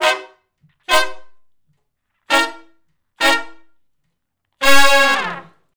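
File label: R&B Riff V. IV, I (Db) harmony, Horncraft for R&B; Crimson Blue and Fabulous Horncraft 4 RnB; Sections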